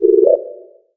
deny; launch

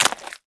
pl step; player

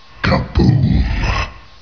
kaboom; nzp

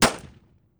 pistol fire; nuclide; weapons

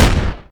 machine gun, weapons; q3rally; oa mgun

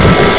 saw guts, weapons; ParoxysmII

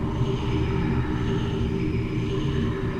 wind; q3rally; world

